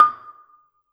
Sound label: Gambang Hard Beater, Gamelan